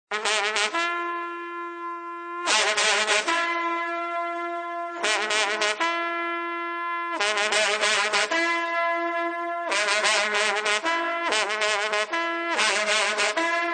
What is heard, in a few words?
karnay